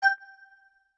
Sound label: Stamina Gauge Lower; The Stamina Gauge getting low, lower, and empty; Skyward Sword; zelda